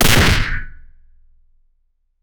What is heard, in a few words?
weapon hit, combat